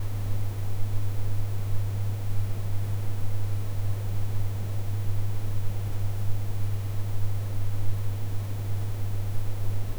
room tone

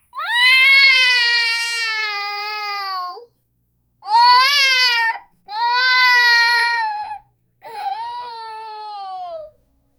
light laughter child excit

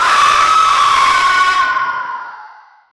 cave dweller horror anomaly

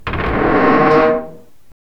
giant stone door struggle; creek

